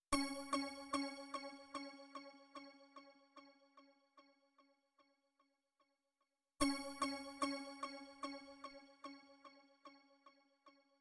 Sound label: 148 BPM F# Min Bell, melody loop stem; Loop Stems; Cymatics; 2022 Melody Collection - Wavy; Man On Fire